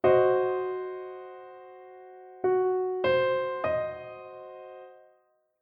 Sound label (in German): Dreiklang Dim Umkehrung